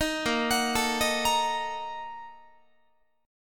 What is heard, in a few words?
A sharp Major 7th Suspended 4th Sharp 5th, Guitar; arpeggiated